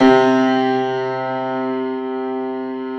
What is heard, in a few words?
pno C1, Classical Piano mp +ped; PS-53 Total Piano; Brilliand Classical Piano